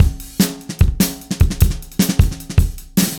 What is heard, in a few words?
Acoustic Kit, Drums & Percussion; Electronic Point Blank